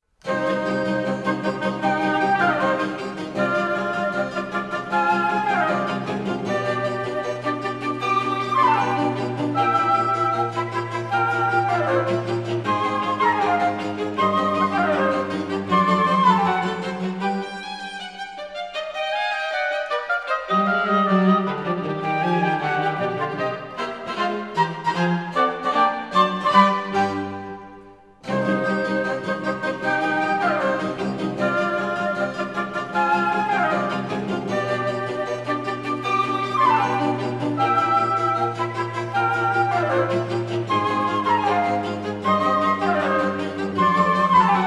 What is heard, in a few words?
Baroque Chamber Ensemble